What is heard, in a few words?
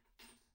keys; IEEE AASP